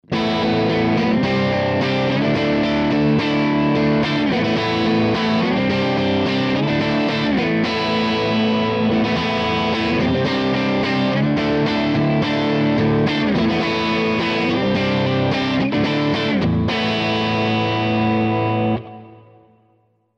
Epiphone Les Paul 60s Both PUs Crunch

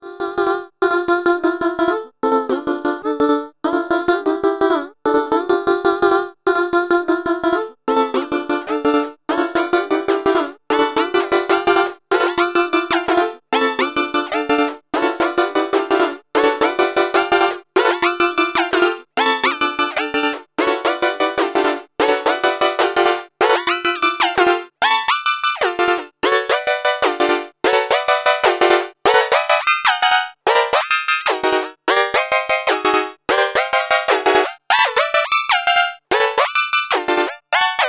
Rossum Electro Music Panharmonium Choir

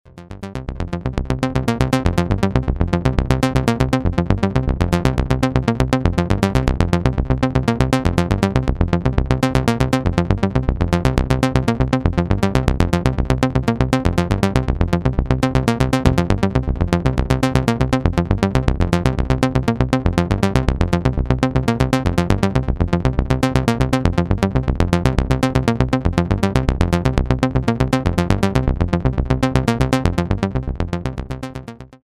Roland JP08 SAW SEQ

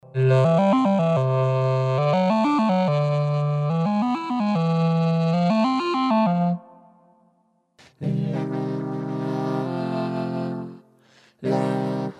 Roland VT-4 Harmony MIDI

